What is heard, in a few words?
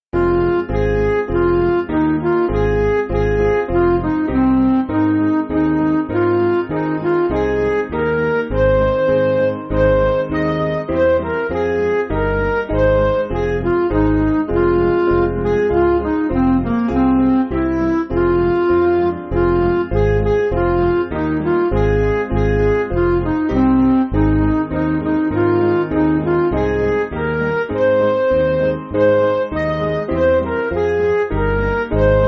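Midi, Music Backing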